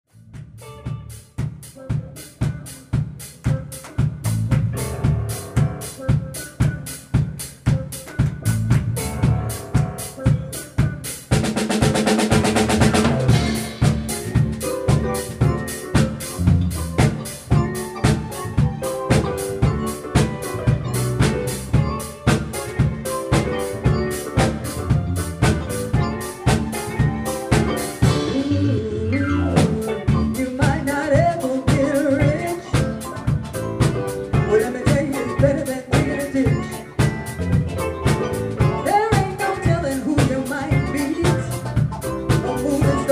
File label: Band Car Wash